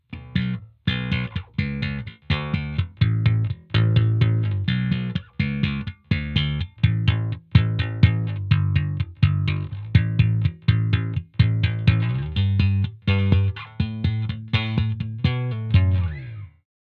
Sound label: slap rock, bass; fender bass roadworn